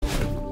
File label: celeste sound dash